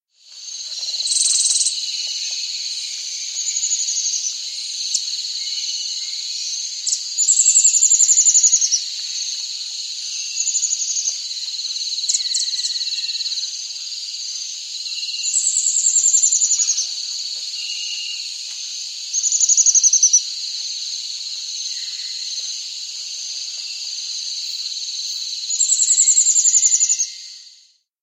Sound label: Stipple-Throated Antwren; S. America